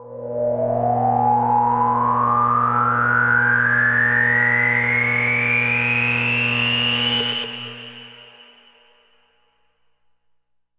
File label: Energy